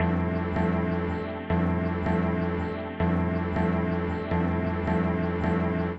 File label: SYNTH PAD AMBIENT 160 C, Ambient; eJay; Sphere